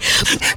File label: breath three quick; ooh ahh